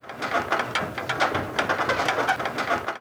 Metal Pulley

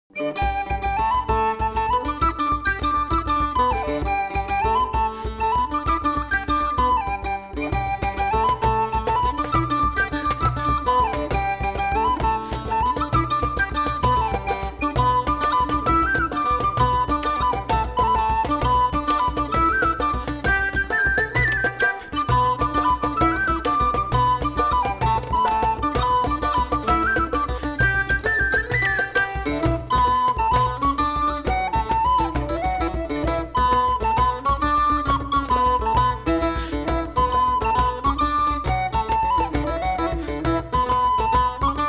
Upbeat May ball; anno 1503 gamerip

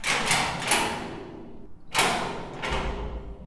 Metal Lock

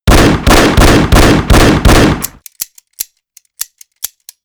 LtKimbleShooting; Lucius III; pc linux

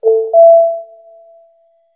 Knock Notification; Do Not Feed the Monkeys

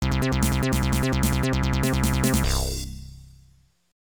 Start Level; bombaman extra ammo msx2

